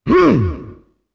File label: ATTACK; UFC Tapout; XBOX